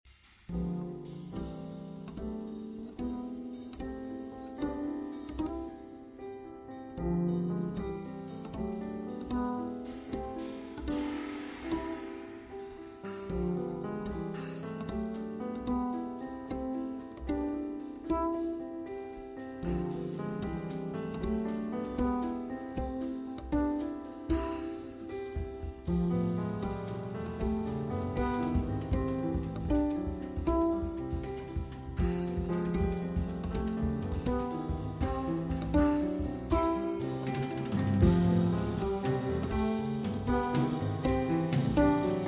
Ballad

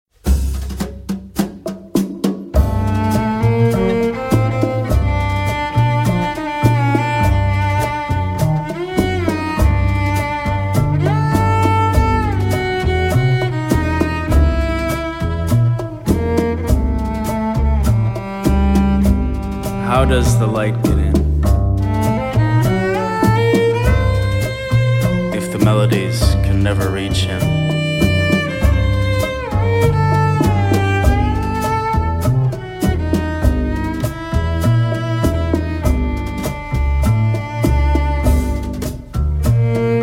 Sung in vain

